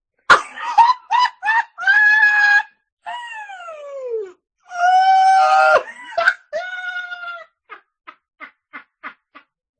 laugh

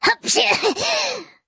sneezing; cagliostro

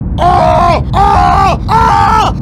oaohh

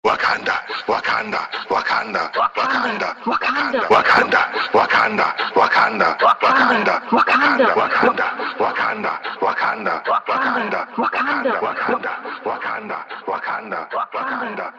wakanda chant

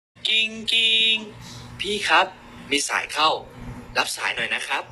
ringtone; win metawin

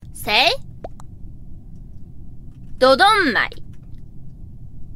polka

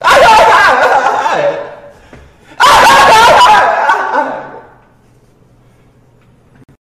laughing

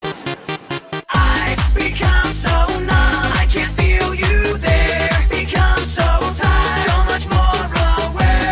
remix

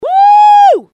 wooo

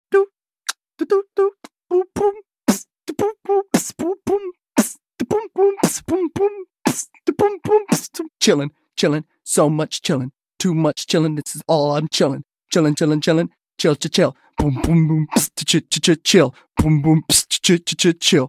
beatbox

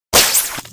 web thwip sound effect; spider-man

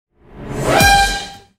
follower sound

